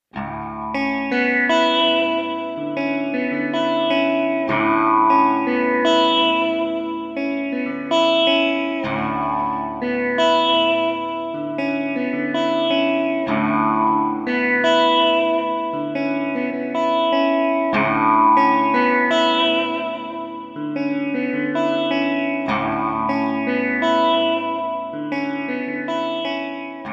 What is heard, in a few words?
Trem Sitar, Variax